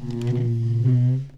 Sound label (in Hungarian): székhátratolás; zajosság szerint